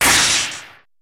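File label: Electric Crack Zap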